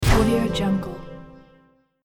magic poof mystery

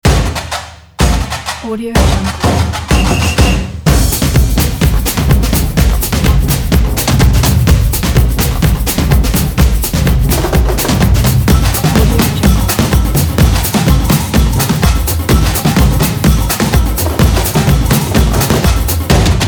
football samba percussion

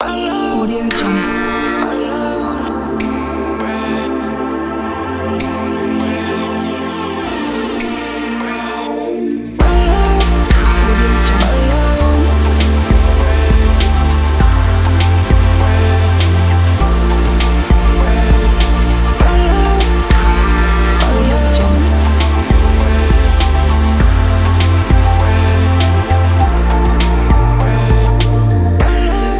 abstract background

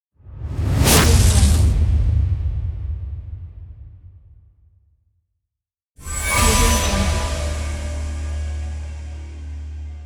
fast swoosh and impact pack

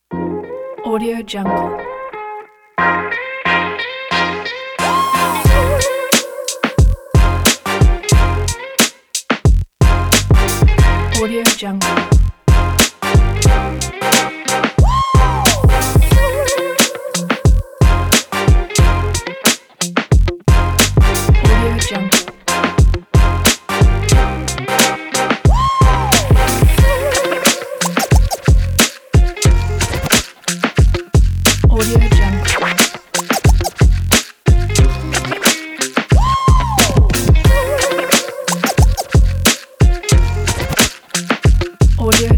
vlog hip hop

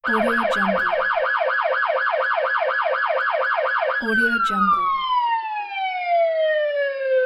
police siren

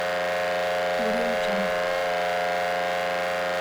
scifi electrical transformer loop

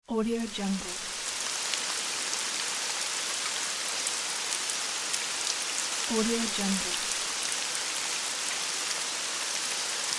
soft rain

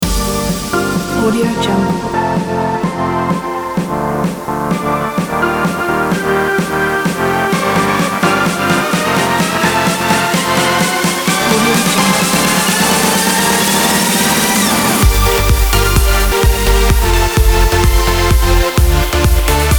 edm diamond

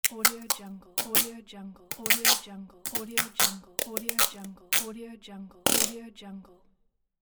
scotch tape

